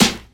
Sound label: old school sharp hip-hop acoustic snare A key, steel snare drum